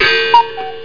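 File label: Cuckoo clock; FROSTY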